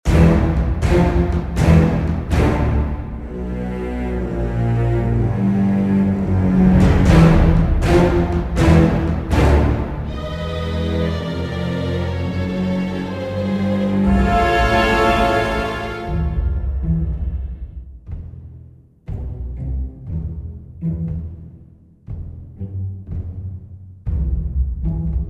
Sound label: bg dramatic action; Haunted